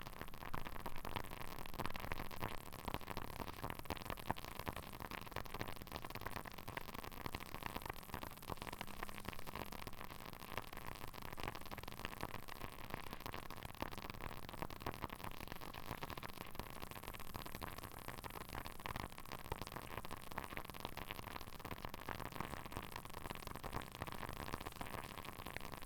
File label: Brewing